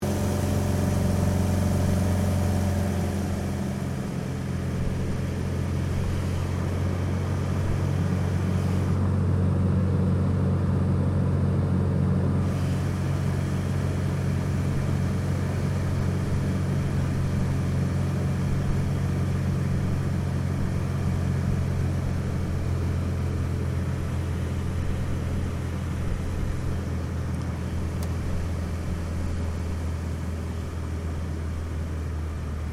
AIRCRAFT CT4A APPROACH LANDING INTERIOR, military trainer aircraft; Airplanes; Vehicles